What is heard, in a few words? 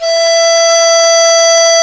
QU DI E5, QI-DI FLUTE; Spectrasonics; Heart of Asia